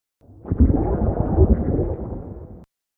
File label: Underwater Element, Water; nature